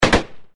Enemy Snd Fire

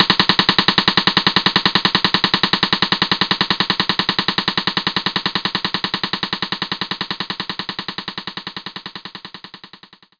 sidestick Cs1

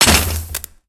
Character Body Impacts

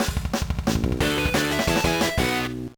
Jingle, gba

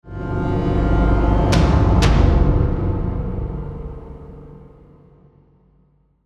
Tension; Match Over

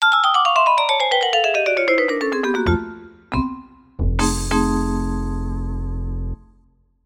Lose; House2

